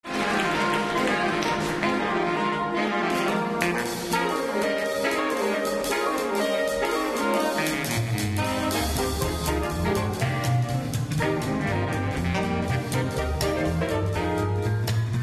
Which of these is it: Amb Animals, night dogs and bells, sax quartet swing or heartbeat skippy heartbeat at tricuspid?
sax quartet swing